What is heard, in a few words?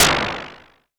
perc, Novation Bass Station 2